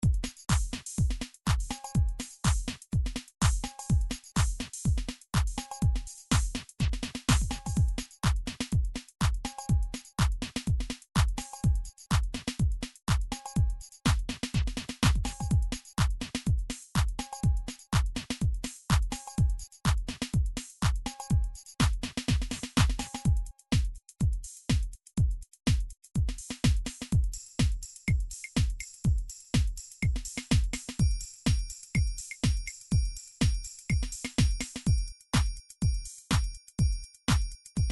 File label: drums house, midi drums